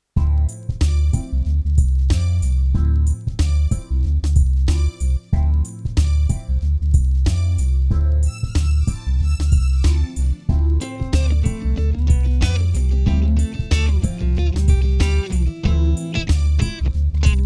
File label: funk